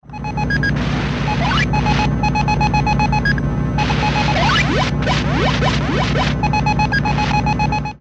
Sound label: Scanner; Airwolf